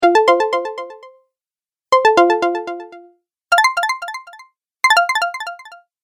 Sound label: Approved App Sounds, beep